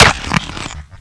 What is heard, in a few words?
headshot